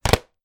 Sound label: technology game disc case down on surface; nintendo wii